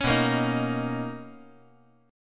B Major 9th B, chord

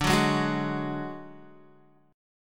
D Major Flat 5th D Guitar, chord

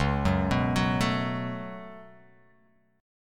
C sharp 7th Suspended 4th Sharp 5th C sharp

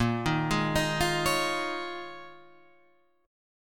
Eb 7th Suspended 2nd Suspended 4th Bb

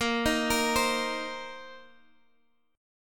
C Minor 7th A sharp

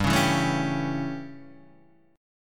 A 7th G, chord

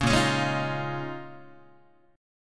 A sharp Minor 11th A sharp down Guitar, chord; 4,6,4,6,7,4; strummed; JGuitar

